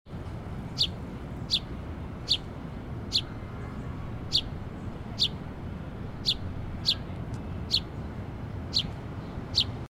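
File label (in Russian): воробей на фоне города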